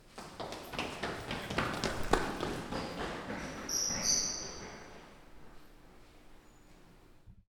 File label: Running